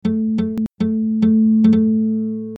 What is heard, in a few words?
instrumental